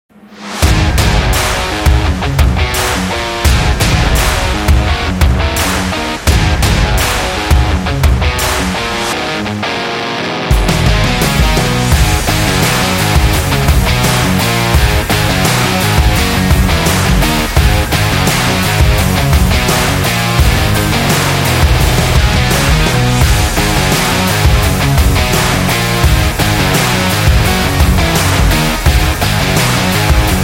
Indie Rock Positive

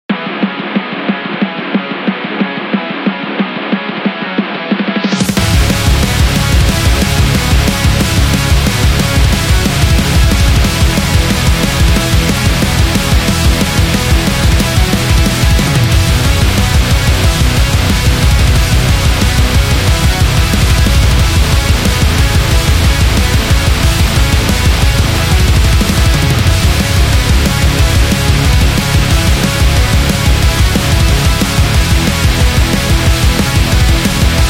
Anime Rock

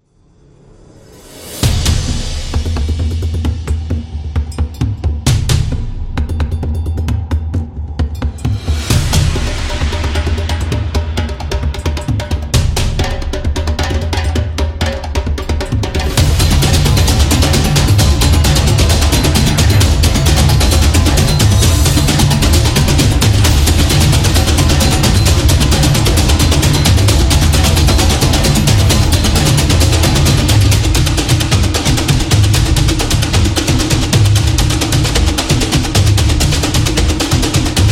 Aztec

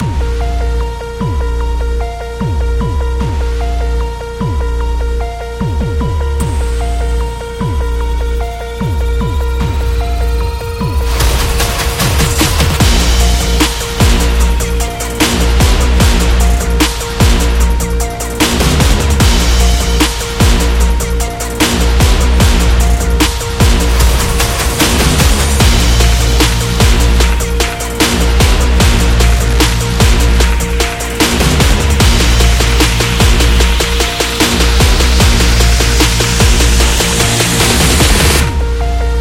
Trap

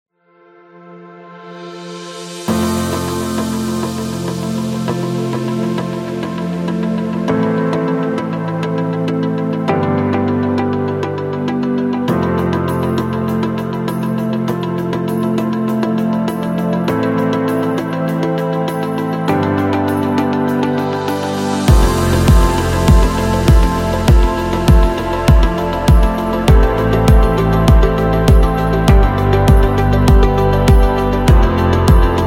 Medical Explainer

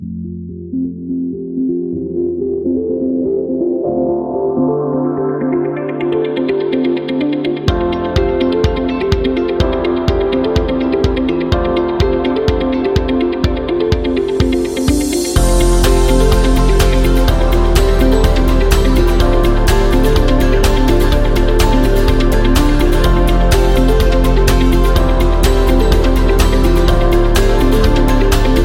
Corporate Inspiration; corporation

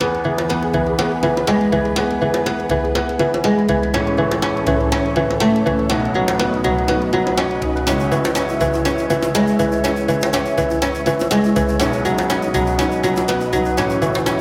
Africa Positive

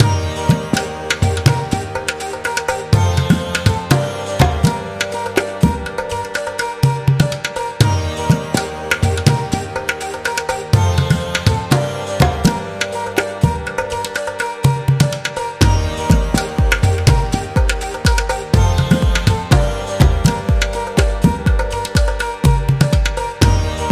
Indian Festival Music

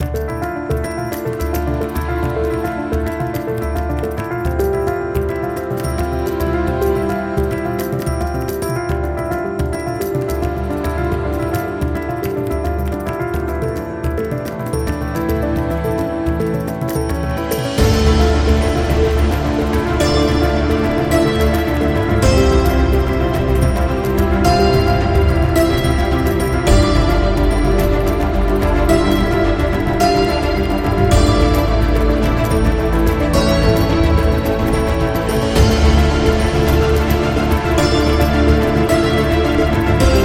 Cinematic India

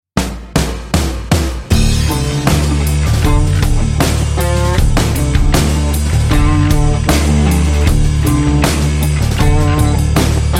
Blues Logo; blues intros

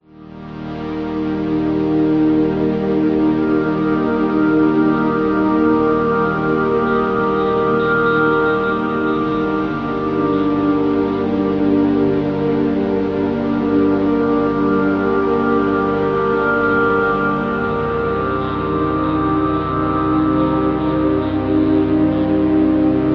Meditation

